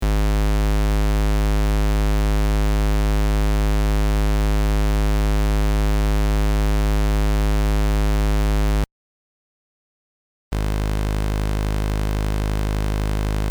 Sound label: Raw Waveforms